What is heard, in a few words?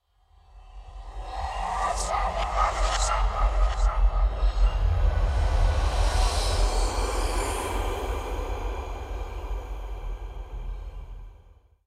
ghost; ghostship